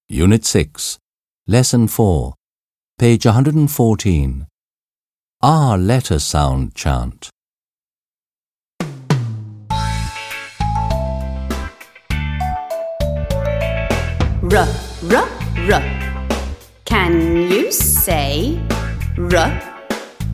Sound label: R Letter Sound Chant